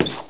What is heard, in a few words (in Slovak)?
krok, osoby